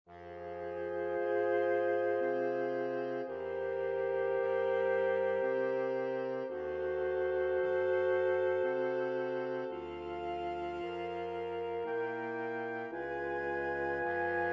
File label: Fantasia in F Adagio sax; Mozart